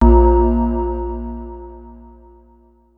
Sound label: Paging Tone